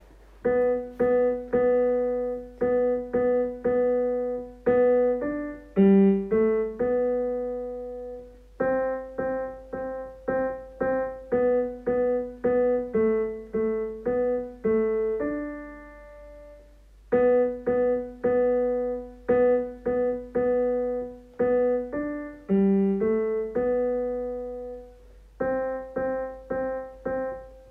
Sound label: Jingle